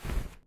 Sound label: Fabric Foley